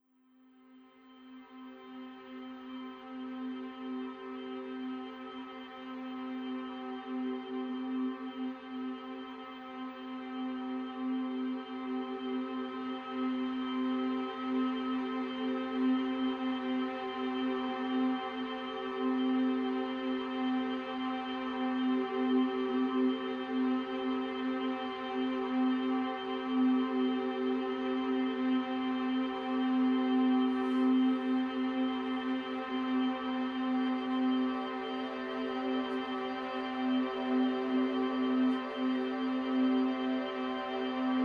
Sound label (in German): C oktaves